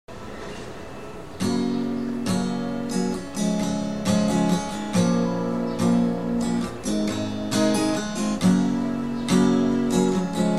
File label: chords